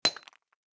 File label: make sculpture